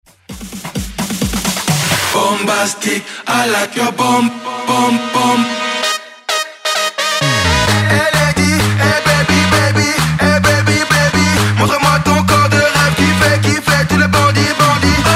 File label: Afrobeat; Redrum